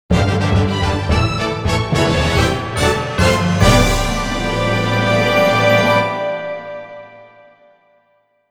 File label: JINGLE MISSION CLEAR; sth2006